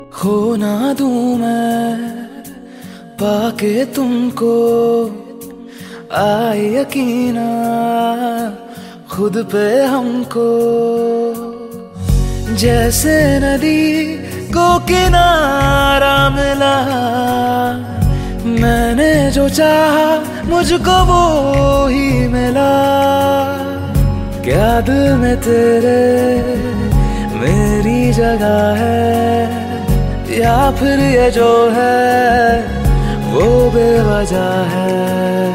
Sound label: Romantic Hindi Song Ringtone